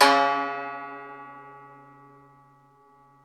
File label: SU GZHENG, Earth Tones